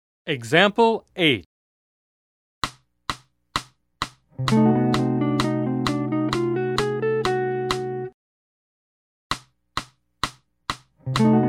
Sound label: Jazz